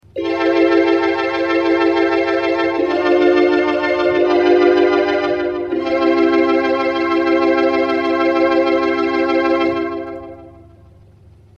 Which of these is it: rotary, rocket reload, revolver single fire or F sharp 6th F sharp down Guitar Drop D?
rotary